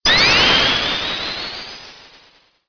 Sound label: spindash start